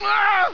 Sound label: die foe, fighting sounds